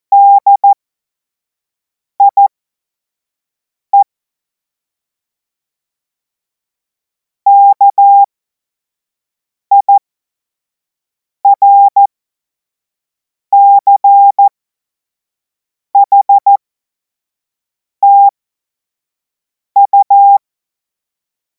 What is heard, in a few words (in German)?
Witz, Morsekurs, 25 F70; cw-morsen